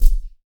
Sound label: mic stand; Spectrasonics; Liquid Grooves 1 & 2; METALS